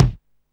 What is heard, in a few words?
Kick, Alesis Hr16, Drums